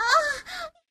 Moan

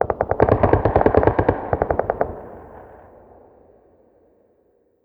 city battle

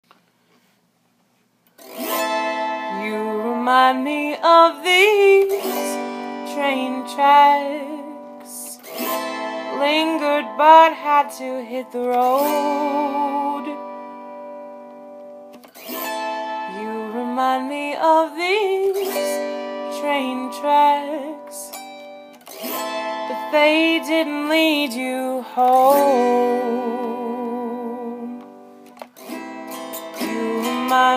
Lamenting Song [singersongwriter][autoharp][folk]; singing; You Remind Me

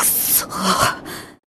Grunt; Lewd SFX

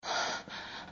Breath; Lewd SFX

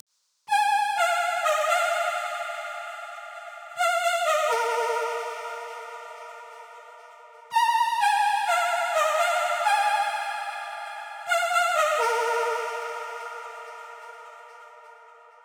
Lead Chorus; 128BPM; Pony Fan Music Remix Stems

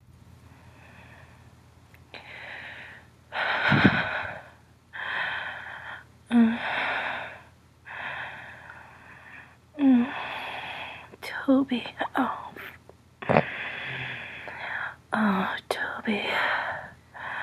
Moans

